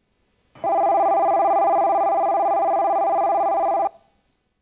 bell, station; keihan

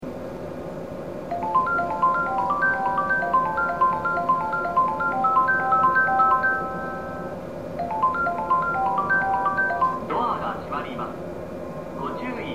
melody, station

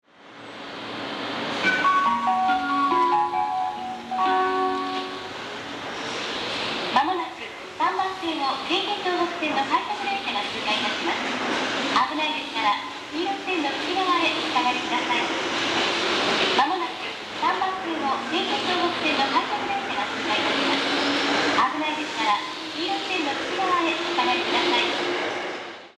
shimbashi pass; 新橋駅; 3番線（京浜南）通過放送; 早口な放送; 新橋駅の3・4番線では他の駅と比べ、早口な放送が使用されていました。; jreast; yamanote

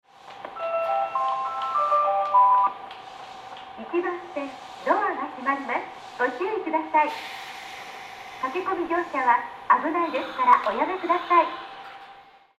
melody, station

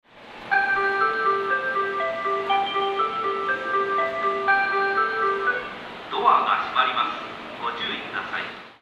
10 melody, jreast yamanote; nippori; 10番線（山手外）発車メロディ

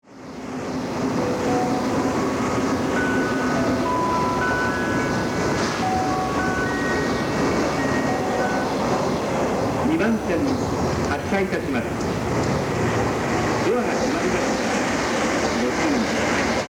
melody, station

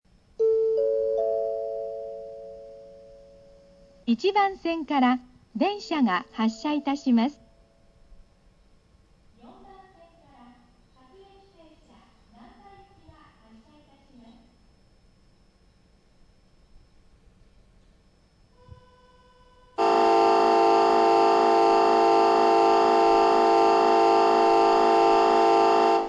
sakaihigashi bell; nankai

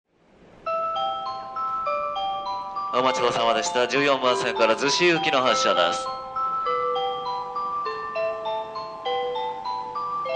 melody, station

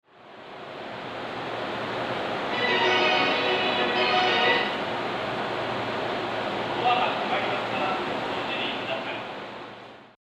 melody, station

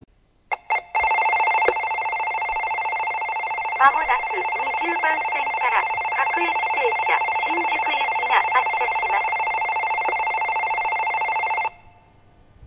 bell, station

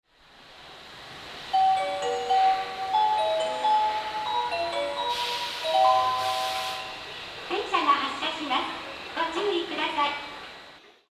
melody, station; seibuikebukuro; 一般列車発車メロディ

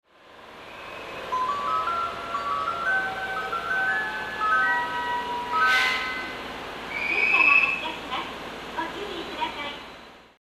kamishakujii melody, station; 4番線発車メロディ; seibu

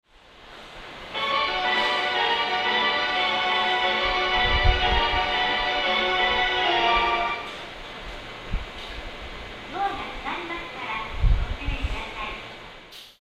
miyahara melody, station; jreast

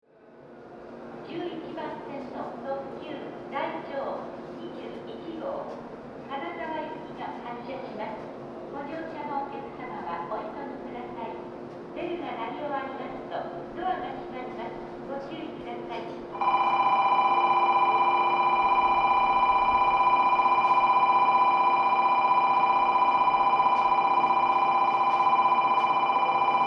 bell

